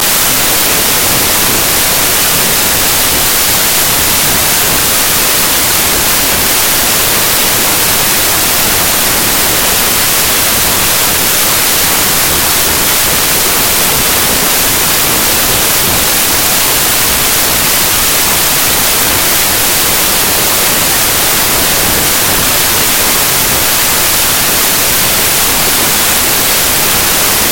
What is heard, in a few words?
Iphone 4 Sms